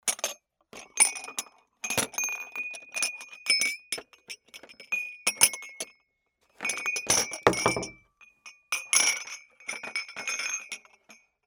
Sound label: dishes